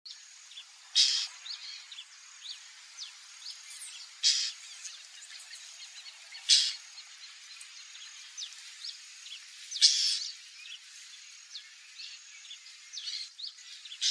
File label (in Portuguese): Canto do calhandra de tres rabos